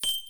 get star; game; shiyiquna

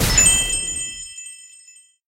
match

